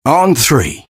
taunt rps exert; engy; tf; taunts

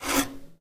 grab frame, foley; video games; source engine; ep2; eli

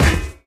physics turret impact sm

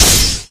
katana impact object; tf; samurai

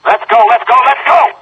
chopper, soldier; Left 4 Dead 2; Source Engine; video games; npc

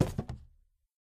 phys cube, physics

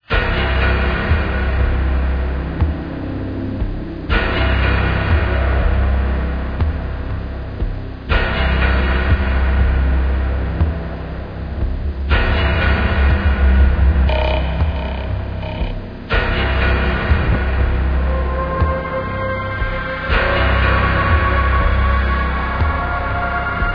Music Nightmare; Source Engine; de deadblock

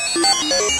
space startup; windows 9x; sound schemes

